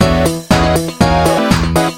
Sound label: pre minigame; tf; microtf2; jimmyt